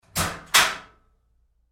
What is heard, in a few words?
Door Locker Open Roomy Hard, Metal Door; Doors